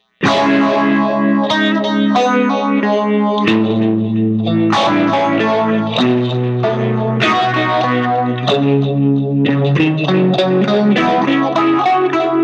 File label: classic stack phaser; cube 30